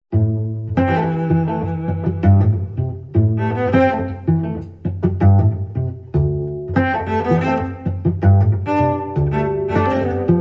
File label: Cello Suite 2 Variation 3